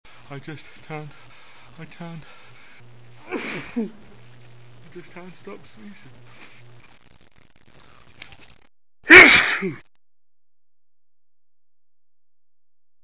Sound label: cant stop, male